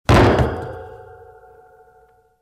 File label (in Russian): na reshetku s metallicheskim gulkim prizvukom; Звуки падения человека